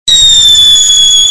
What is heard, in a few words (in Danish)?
heksehyl